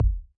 Ball Collision Geo Tackleball Extra Light

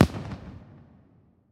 Fireworks

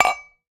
Ball Collision Holiday Mug Hard

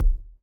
Ball Player Hand Hit Tackleball Light

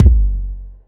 Ball Collision Geo Extra Hard

